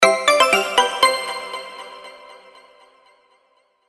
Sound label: notification; Infinix; A88